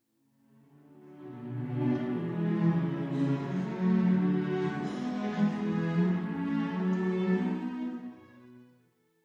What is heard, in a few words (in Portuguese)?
cordas orquestra de bolso